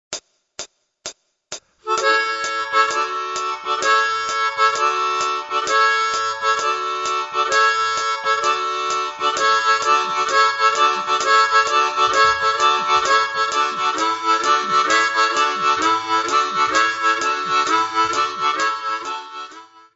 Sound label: harp